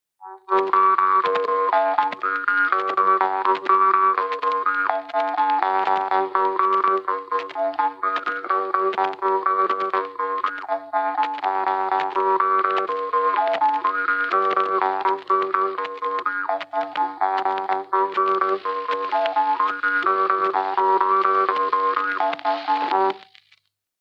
labajalg Lääne-Nigula parmupill folk; Lihulamehe

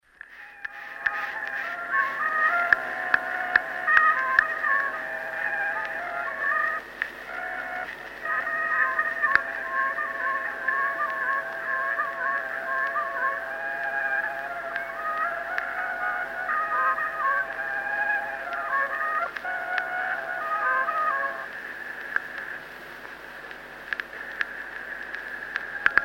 Sabatants, viiul, folk; Kuusalu khk

